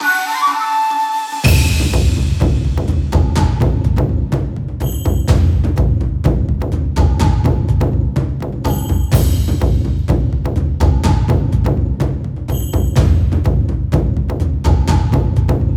jingle lose; gamerip; Sengoku Taiga; android

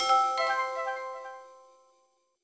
Jingle Unlock Feature

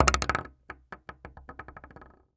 bullet shotgun shell drop wobble wood surface; a dump in the dark

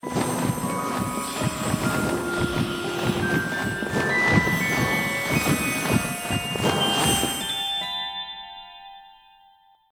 Laser Flip Platforms Sequence With Music; Ori and the Blind Forest; Misty Woods

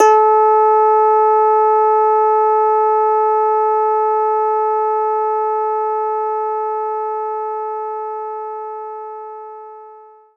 Finger Bass G1

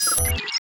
code puzzle reset

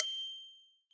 deskbell; Scrat

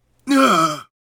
Grunt

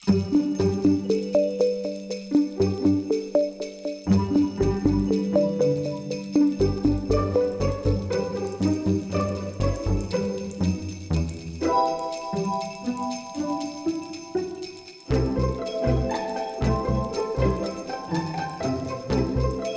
Social Hub Ambient Low; Plants vs. Zombies Battle for Neighborville

